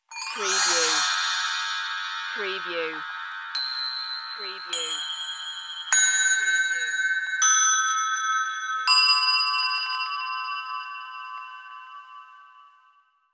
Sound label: Bells Chinese Small